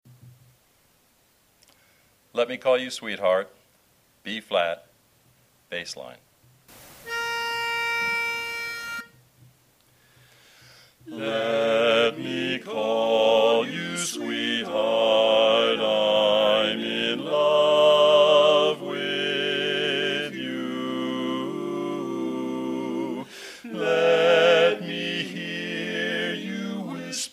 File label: Sweetheart Bass; learning tracks